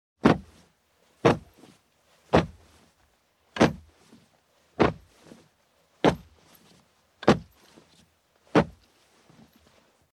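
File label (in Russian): Одеяло покрывало трясут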